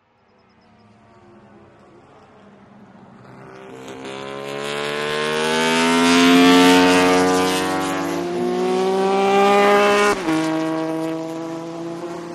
Motorcycle Road Racing

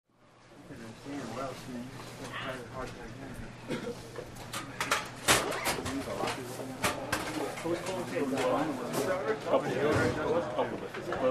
Locker Room Male Wal, locker room sports

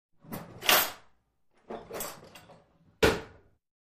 Full Silverware Draw; drawer

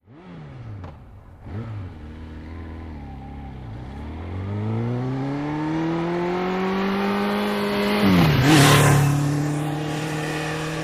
Motorcycle, Kawasaki 750, By Fast

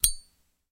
Zippo Lighter Open; lighters & matches

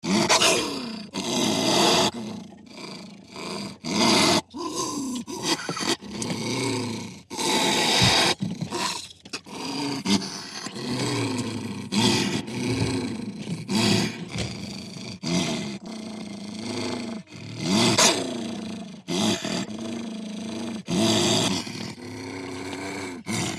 Vicious Animal, animals wild wolves

